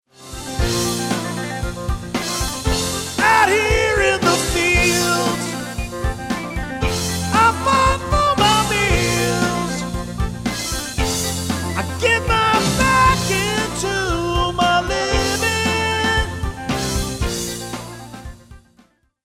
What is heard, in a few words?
Sings